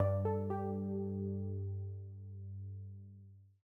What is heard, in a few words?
Classic UI SFX Chords; gameflow-deck